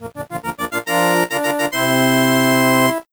Flagpole Fanfare; SMA4

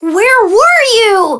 Alarm Clock

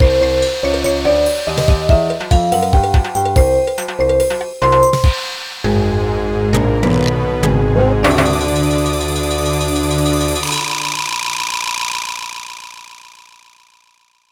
Boss Victory; Jumpy Jungle

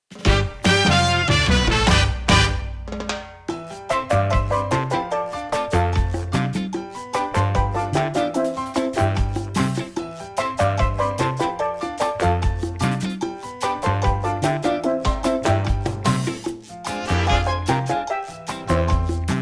Ending Arrangement; SMB3

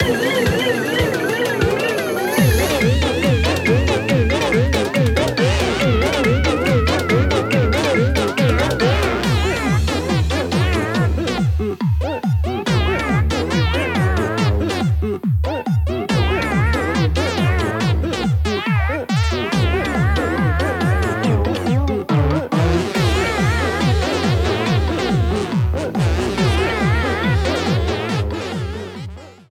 Midboss Battle Low Health

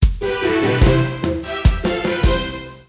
Win; Swirling Courtyard

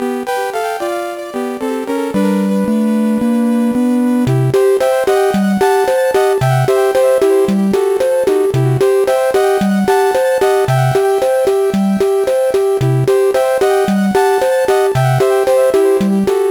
Underwater theme; SMA4

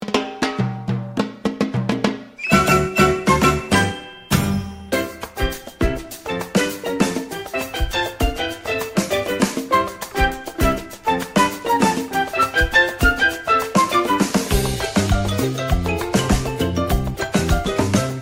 Ground bgm day; super mario bros

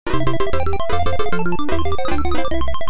Game Over Theme; DK3; Arcade

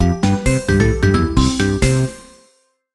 Boost Pad Bonanza Win

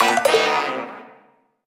Disappointed

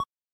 Bonus Point; WC98

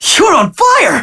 Alarm Clock

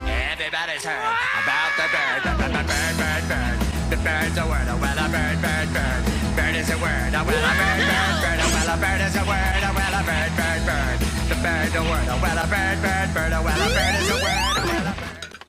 Short music sample of a cover song

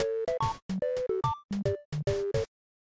Game Over; WC98

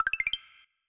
Super Mario Advance's 1-UP jingle

